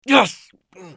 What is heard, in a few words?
bear wound; eft